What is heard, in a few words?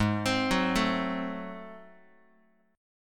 G sharp 7th Flat 9th G sharp down Guitar, chord; G#7b9; arpeggiated; x,4,3,4,1,x; JGuitar